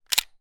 weapon gun walther ppq; still north media

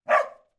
animals dog med bark inside

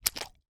human mouth wet open squelch; sound effects one

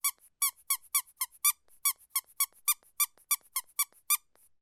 toy squeaky squeaks

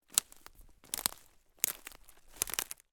foley footsteps twigs small branches forest floor

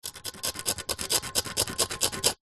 foley concrete scrape with metal sharp

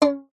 musical violin string pluck high pitched

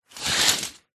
household curtains old draw pull

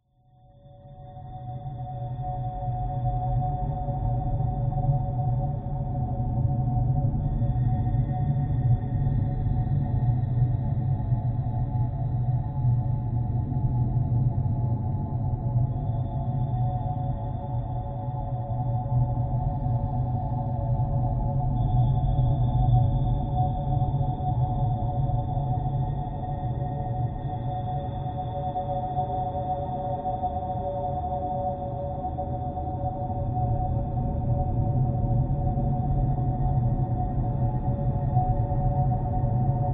horror drone atmosphere processed windchimes; scott lawlor

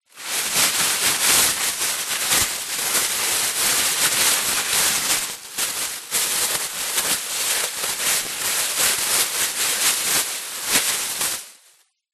foley plastic bag very thin handling rustling

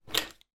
household key car electronic pick up from table

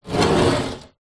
wood drag scrape on rusty metal table; foley; zapsplat

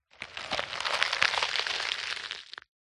science fiction alien egg hatch

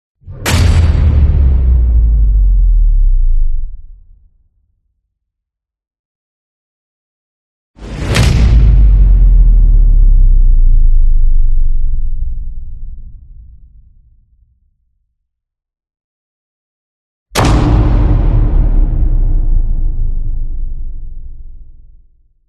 CINEMATIC IMPACT Hit Metal; smartsound

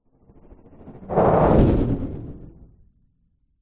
science fiction space fighter fly past fast

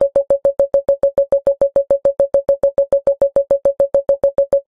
science fiction alarm loop

